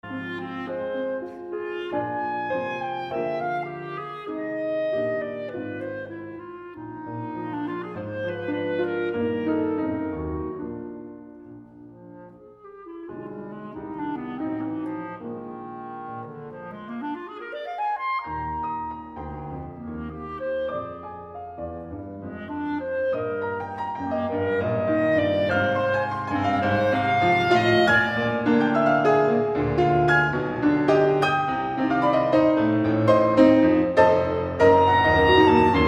Clarinet Sonata in E-Flat Major I. Allegro Amabile